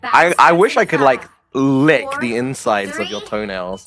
lick

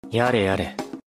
ringtone; yare yare

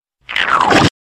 reverse splat

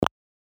ui click

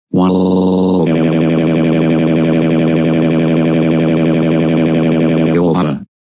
crying; caillou; goanimate old david 2013 voice

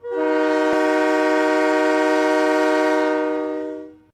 default horn; trainz

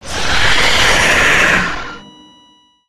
compilated jumpscare

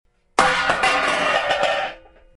pots banging

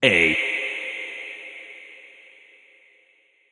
aaaaaaaaaaaaaaaaaaaa

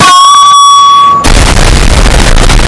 metal hit and explosion; beepteebops

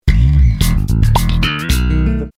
sitcom transition bass riff; seinfeld

